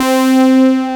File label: SYN MELOD; Sound & Vision - Gigapack I CD 2